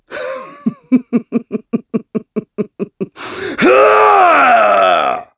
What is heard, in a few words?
Prepare Laugh; laughing; Ghirahim preparing to fight; Skyward Sword